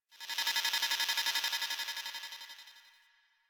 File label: Computer Room Ambient Beeping; Ambience; Warframe; Nineteen Ninety Nine